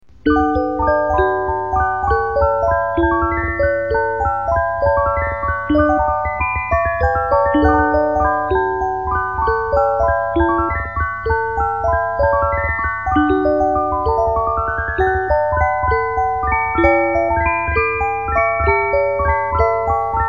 reuge barcarolle, boites musique

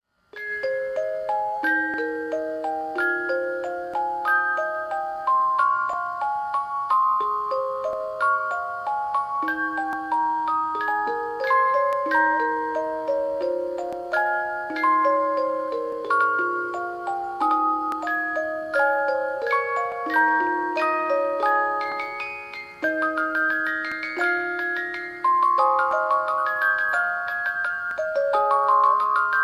canon chinois, boites musique

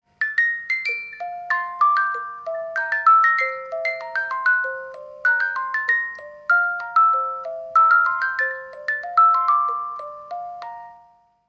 les soeurs jumelles, boites musique

